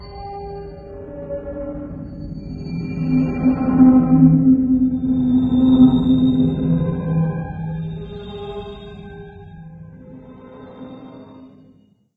industrial suspense, music stingers; Source Engine; video game

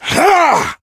melee swing, player survivor voice

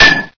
metal, debris; Source Engine; bshift

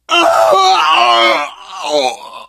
death scream, biker, survivor voice; source engine; left 4 dead 2

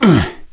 melee swing, gambler, survivor voice

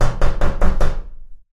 container linear knock; Source Engine; Portal 2

